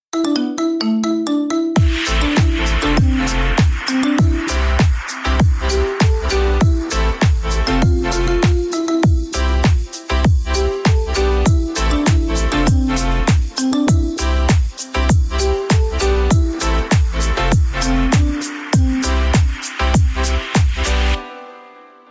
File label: Xiaomi K20 Pro Ringtone; ringtones